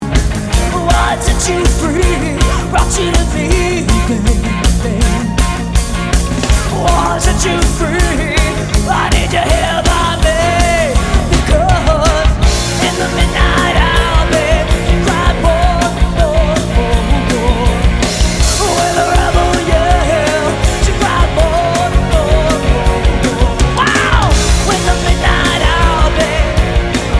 Cover Band

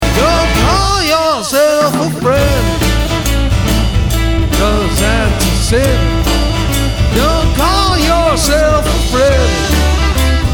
Blues Singer; Toronto

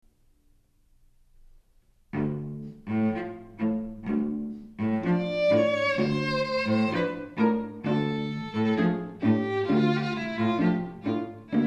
String Quartet Habanero; Seattle

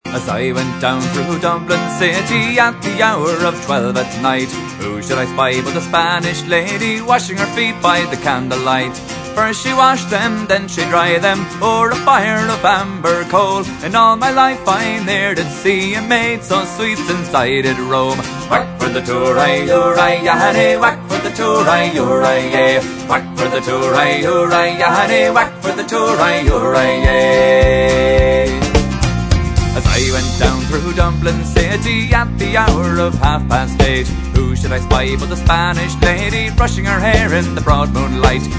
Irish Band

